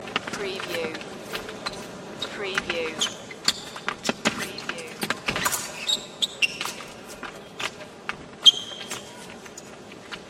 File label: Fencing